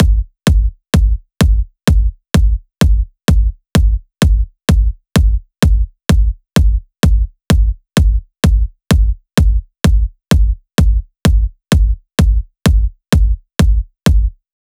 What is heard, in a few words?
Drum Loop 128BPM BD; Drumloops; Multi Loops; VEC5